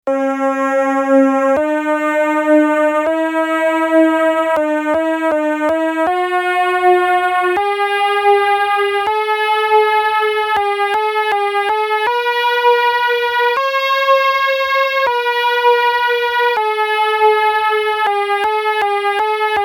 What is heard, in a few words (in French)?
gamme mineure temperee